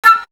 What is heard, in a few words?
Wind; 90 sSampleCDs; Coldcut's Kleptomania VOL1; Brass n Wind